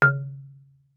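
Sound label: Marimba deadstroke fortissimo Db4; MIS Pitches - 2014; Percussion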